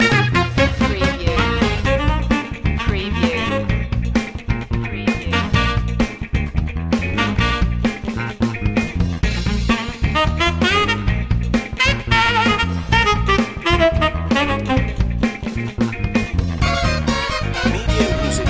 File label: busy funkster